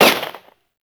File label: Shield Fire; Warframe; Caliber Chicks Minigame